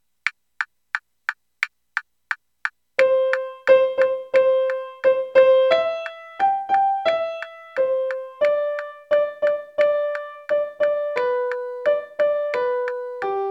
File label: primo